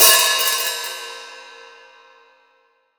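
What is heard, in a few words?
MIX open hi-hat, OLD HH; AKAI S-Series CD-ROM Sound Library VOL-3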